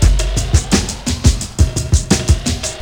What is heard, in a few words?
HI-ENAGY LP, breakbeats; Crypton - DrumBase5000; BREAKBEATS#4